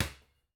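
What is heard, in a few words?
simple small basketball one drop; sporting goods; nova sound hoops; sporting goods master collection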